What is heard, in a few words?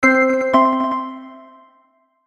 Logo Synth Chords Pan Delay; Sonic Logo; Blastwave